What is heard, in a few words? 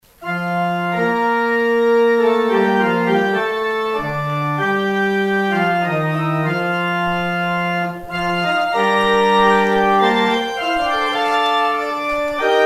organ outro; Organ exit music